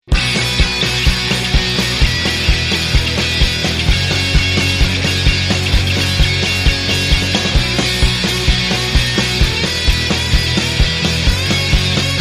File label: w Lead Guitar